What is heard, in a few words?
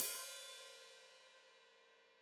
Hat Foot Open, Hi-Hat; Deeply Sampled Drums; Cymbals Stereo